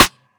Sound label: Snare